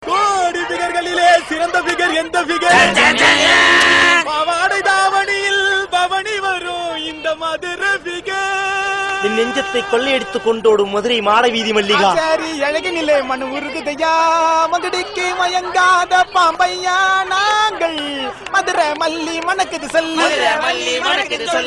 comedy song

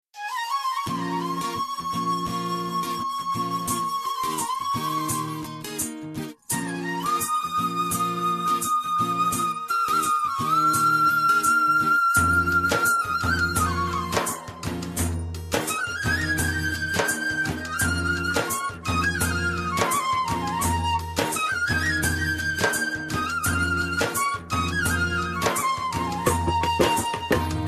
Flute Sad

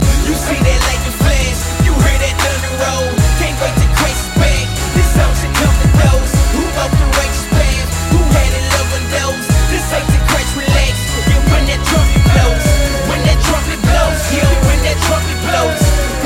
Trumpet Blow

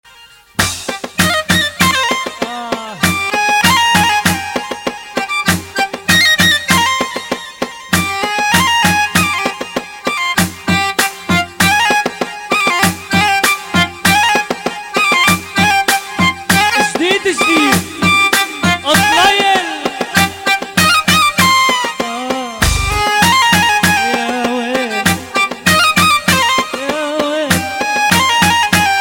Super Arabic

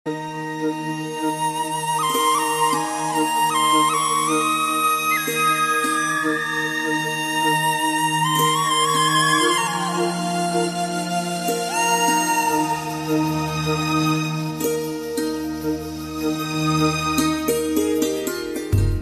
Digital Flute

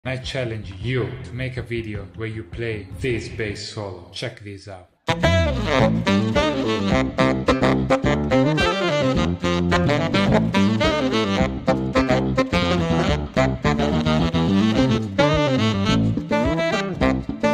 Cool Sax

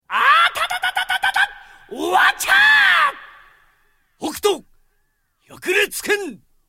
Crack Fists

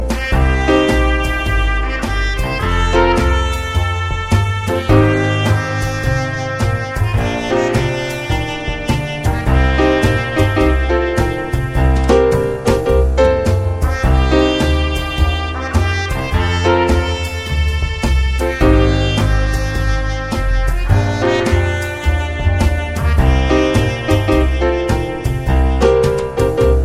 Jazz Mix

